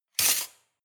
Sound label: cutlery large multiple drawer place hard